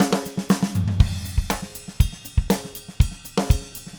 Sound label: Green Maple Groove 120 BPM, Vital Drums, DW Green Maple Kit; Sonic Foundry (Sony Creative Software)